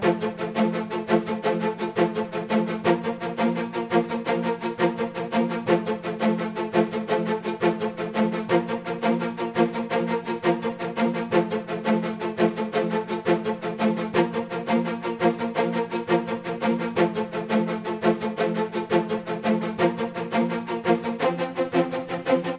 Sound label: Loop Nofade Nobase Acento; King Lucas